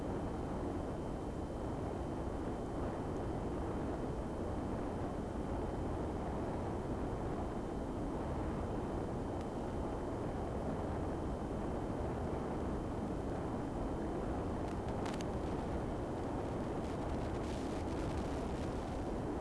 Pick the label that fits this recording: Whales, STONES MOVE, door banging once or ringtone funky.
Whales